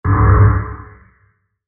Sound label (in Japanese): 重力魔法 小; モノラル; 魔法効果音